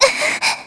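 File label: Vox Damage, Korean; kingsraid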